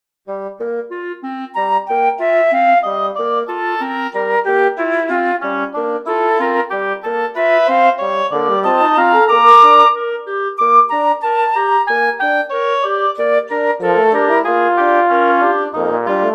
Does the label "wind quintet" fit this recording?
Yes